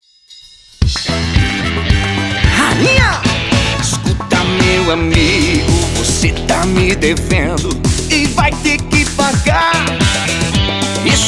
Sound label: drums